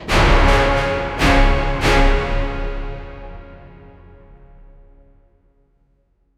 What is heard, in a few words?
epic orchestral hit taiko